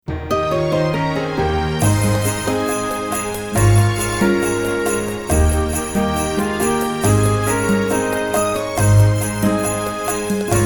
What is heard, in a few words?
Accompaniment; Bake Off Finale; The Great Big Holiday Bake Off